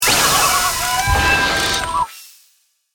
Hit Ascend Silly; Production Element; BlastWave

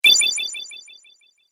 Magic Health Boost, video game

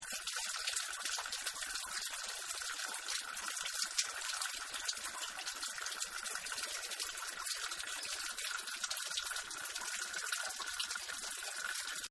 Mountain Spring, Rivers And Streams; River Sound Effects; Nature

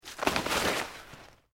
PLANT LEAVES LARGE MOVE FAST, rustling; Material components for constructing more complex sounds

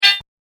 A Short Sharp Shock, Hit; horror; impact sound effect; Andrew Fleming

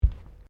Footsteps Bare feet Tiles Step; Footstep Foley; Footsteps (Sound Effects:Human)

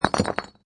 Concrete Debris; Cracking Sound Effects

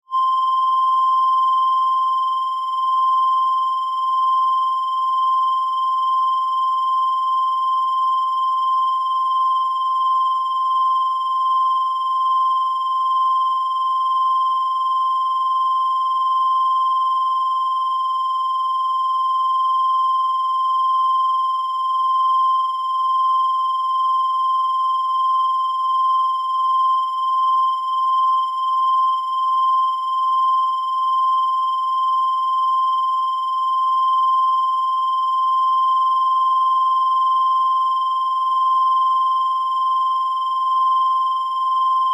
Science Fiction Scanner High Pitch Modulation; Tech (Sound Effects:Science Fiction)

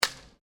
Gun Toy Dart Wall Hit; Hits; Objects

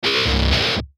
Musical Warble, Musical Warble Descend; Ascend; Warbles; Blastwave

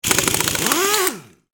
Industry Air Wrench Tighten; Power Tools

